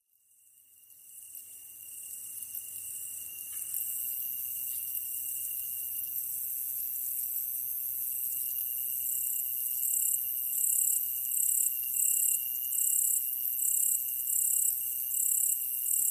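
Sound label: katydid; yamayabukiri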